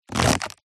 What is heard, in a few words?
foley rubber thin elastic stretch stress